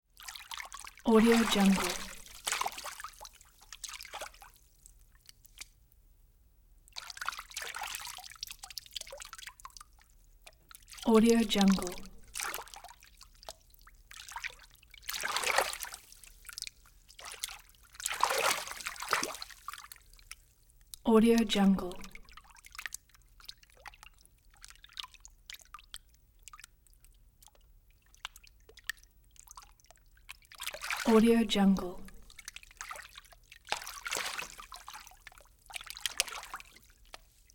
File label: light water movements in a pool